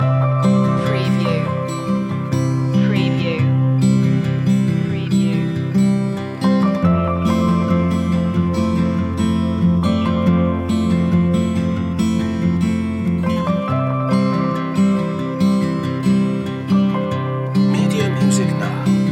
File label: summer rain loop